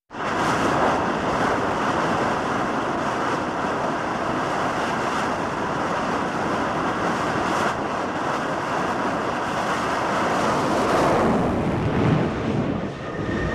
Catapult take off of jet fighter from aircraft carrier